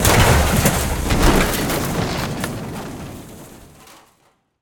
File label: metal debris